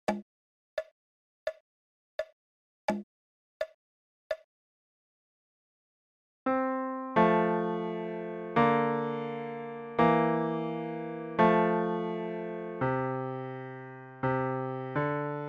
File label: Swing Low Left